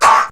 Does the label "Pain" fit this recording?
Yes